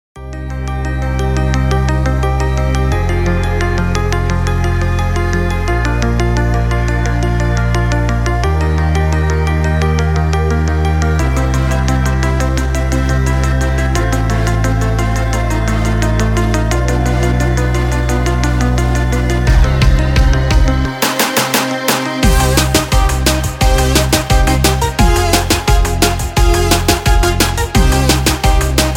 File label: ringtone